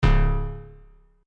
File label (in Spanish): Fallar Disparo; SFX Gameplay 3